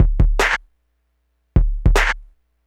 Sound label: drums, Hardcore Breakbeat 152 Dmin; Big Fish Audio; Hardcore Breakbeat Action; Gone Insane